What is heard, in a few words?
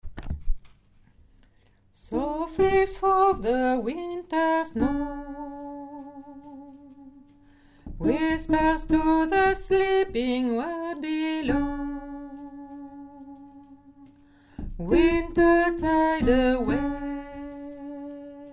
soprano, chorale